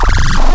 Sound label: Squelchy FM, Spectral; Xfer Records Serum; Serum Presets